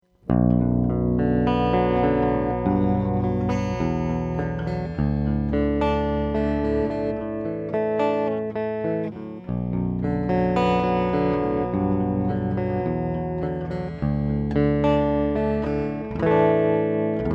Keeley Hydra Tremolo

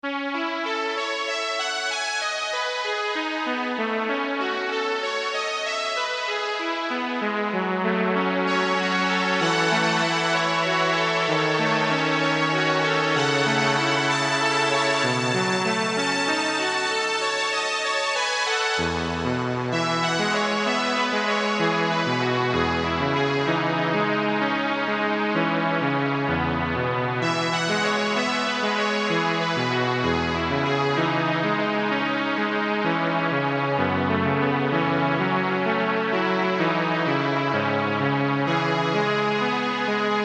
Debussy On Solina

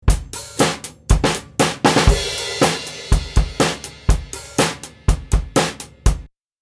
Zoom F8n Drums more Slate Mic

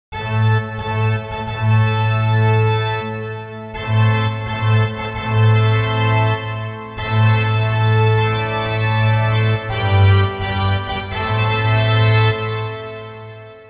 Church Organ

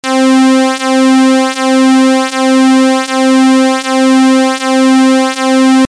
inverted saw pwm

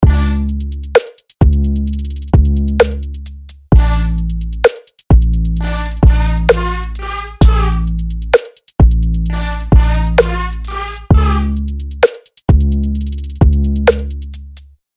Trap; Nexus 3; Trap 4; Fast Lane

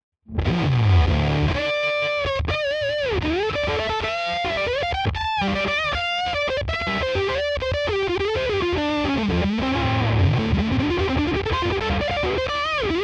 FUZZ Lead Lick; Filter Flat 12 Gain max

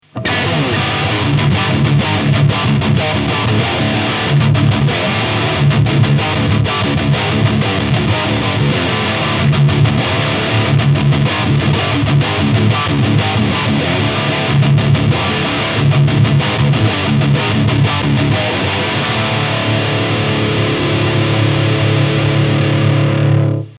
Gibson Les Paul Standard 60s Faded High Gain

